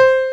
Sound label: piano